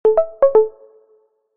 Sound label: notification tone soft subtle